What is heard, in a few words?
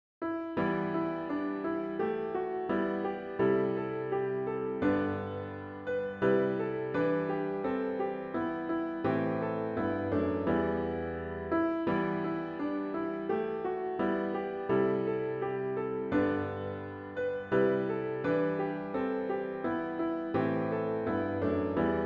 Cheering Chanting Dizzy Crowd piano only; worship service; palm passion sunday